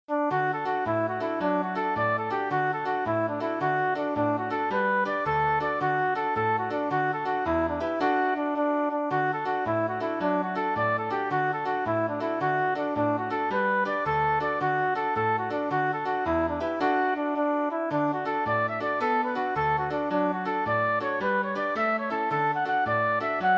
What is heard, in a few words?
jig set